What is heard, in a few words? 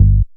BAS TON, SOLID BAS